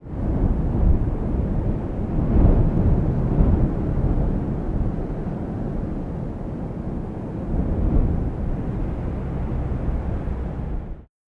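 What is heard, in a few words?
Ayers Wind left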